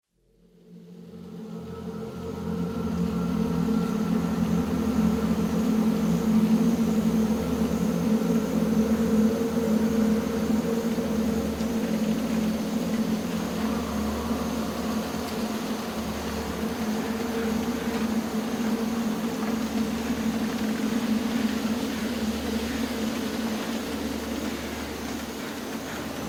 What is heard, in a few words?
Haunted Forest; themepark; screampark; attractions; Mazes